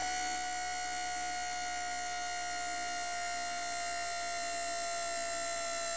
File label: zoom out; Ringtones